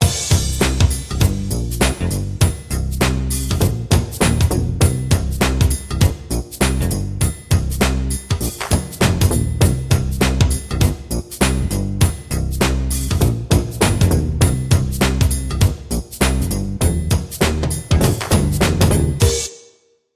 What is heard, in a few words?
funk, instrumental